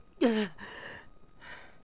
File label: Sneeze; Adult Link